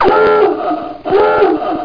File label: chimes